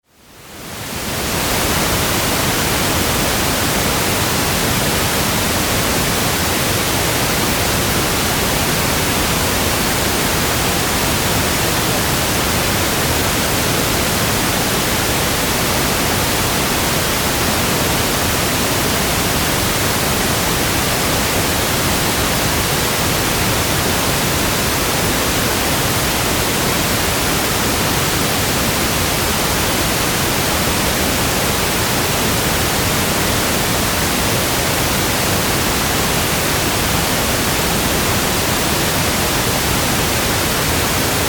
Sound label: pink noise